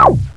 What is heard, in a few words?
BLIP